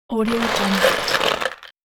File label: toy plastic building bricks